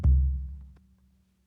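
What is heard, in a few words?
Calm Far, music; from dust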